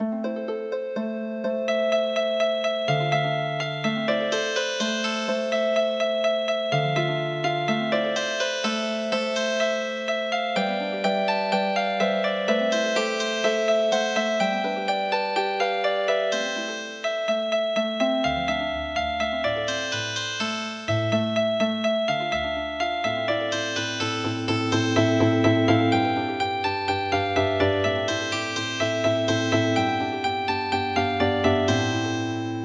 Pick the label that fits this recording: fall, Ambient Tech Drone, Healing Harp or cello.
Healing Harp